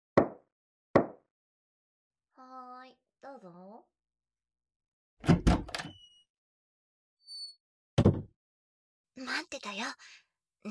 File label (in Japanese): キス 大人のキス